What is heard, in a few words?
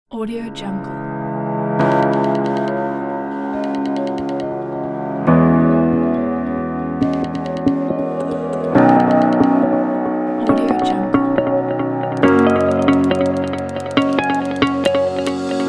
tech ambient